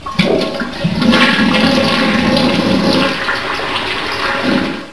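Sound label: flushing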